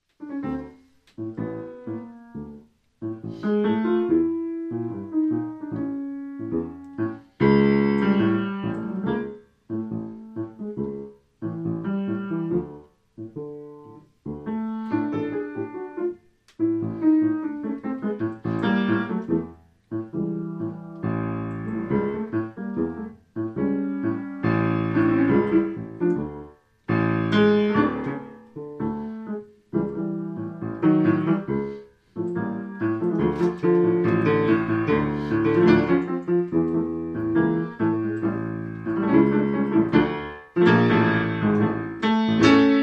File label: Tango At The Bar